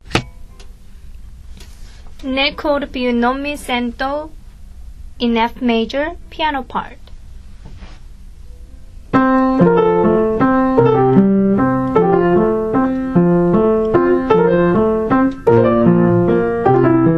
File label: piano; nel cor